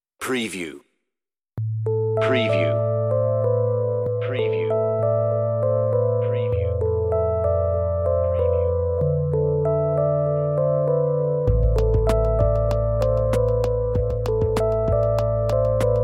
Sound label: Beautiful Dream, on-hold music